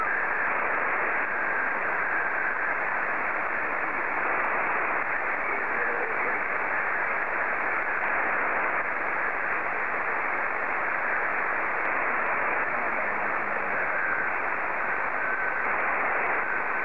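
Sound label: SSTV, Martin 2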